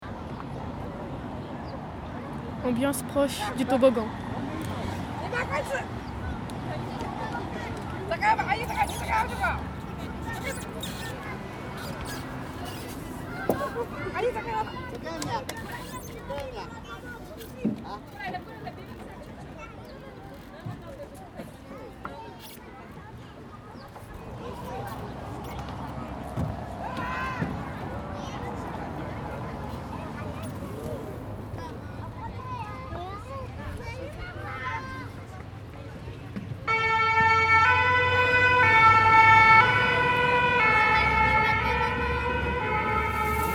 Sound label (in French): ambiance tobogan square